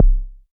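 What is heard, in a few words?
kick, 5U Modular Drums; Polyend; Simulacrum Sounds; 1 Shots; Synths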